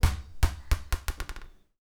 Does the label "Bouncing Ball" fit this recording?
Yes